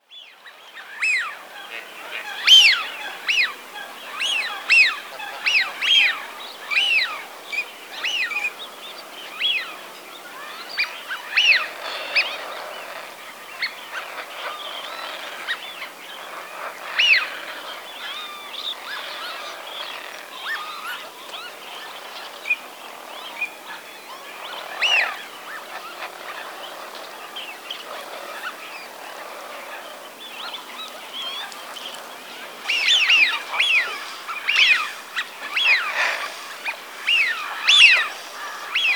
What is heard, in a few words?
Wigeon